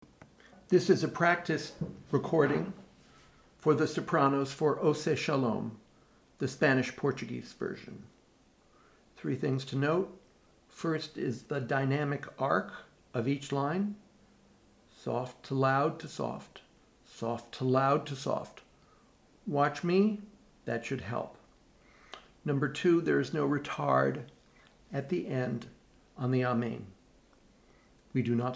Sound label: soprano; Oseh Shalom; Spanish Portuguese